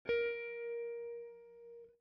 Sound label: C high